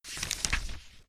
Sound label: page turn